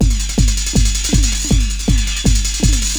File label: LOOP, Eurotech; Sounds Good